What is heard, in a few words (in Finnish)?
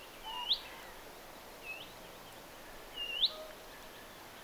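olisiko koiras ja naaras pajulintuemo huomioaanta, kaksi erilaista pajulinnun huomioääntä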